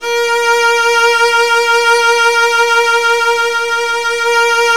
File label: Virtuoso Strings